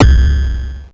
BD, Mutable Instruments Anushri

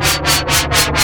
Korg, FX

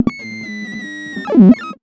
Big Beat Drumloops, SPEED 140

